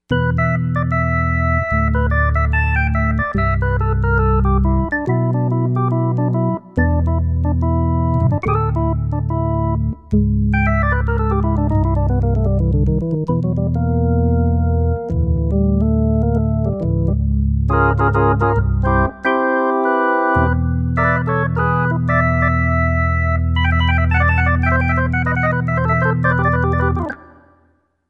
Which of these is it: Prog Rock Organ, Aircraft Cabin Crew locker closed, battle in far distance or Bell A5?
Prog Rock Organ